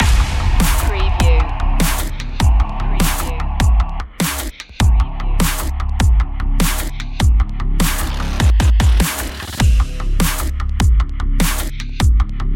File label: hybrid human loop